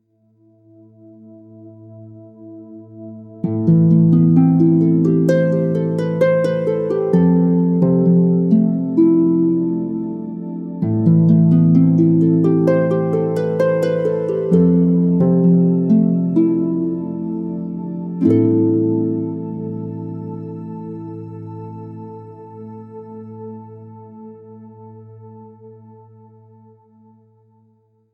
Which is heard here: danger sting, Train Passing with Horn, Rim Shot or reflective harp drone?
reflective harp drone